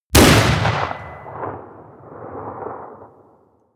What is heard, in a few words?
pistol muzzleloader crisp stone; schusswaffen